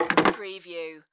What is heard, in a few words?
Pay Phone Hang Up